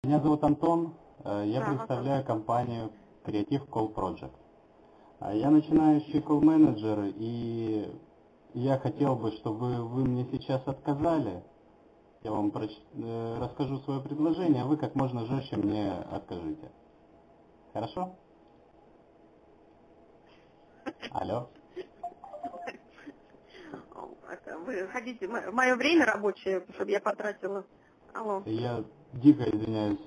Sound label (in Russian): smeh